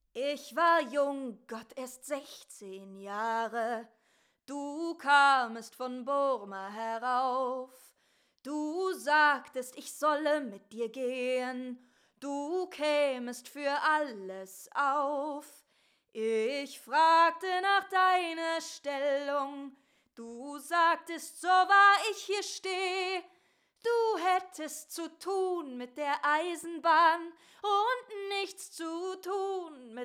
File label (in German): Gesang